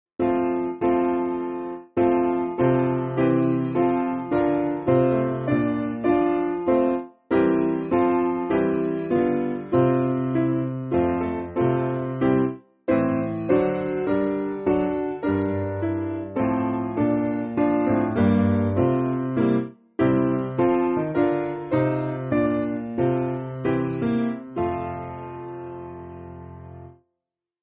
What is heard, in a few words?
Piano; Lord Christ True Peace; Westminster New